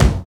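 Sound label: bass drum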